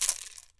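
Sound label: COWHORN RATTLE, PERC; AKAI S6000 CD-ROM - WorldSounds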